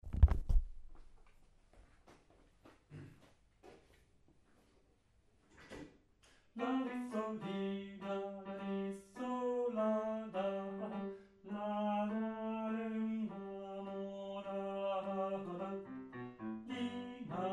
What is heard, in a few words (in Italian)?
Tenori II